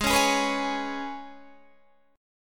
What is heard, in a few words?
Ab Minor Double Flat 5th down Guitar DADGAD